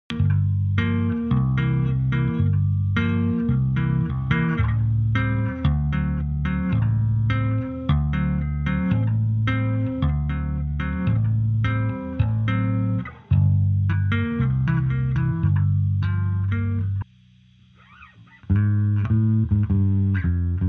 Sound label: bass